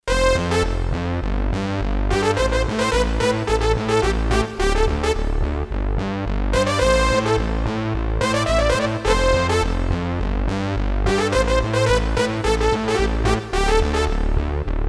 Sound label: BRASS, Korg DSS-1; KSDU-002 Brass